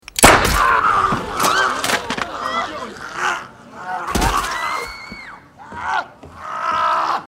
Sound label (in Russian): vystrel i kriki ranenogo; zvuki ubijstva i trupa